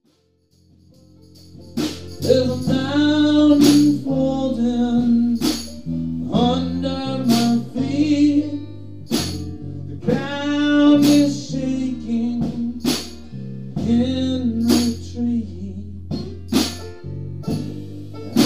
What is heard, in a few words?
Storm Blowing